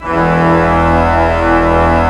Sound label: pipe, pipe organs; Greytsounds VOL-1